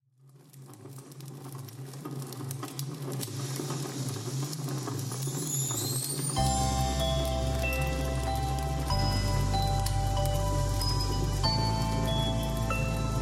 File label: sleighbells